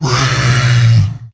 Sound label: Dino Groan